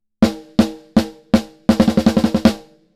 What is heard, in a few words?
snare; Neutron 4